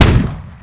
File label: Chain Gun Fire; Defiance